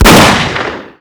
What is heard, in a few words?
Pistol shot short; Doom